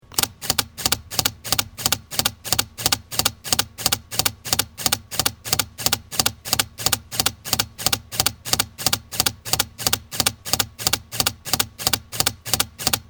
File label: panasonic dmc g10; G1 Sandisk jpeg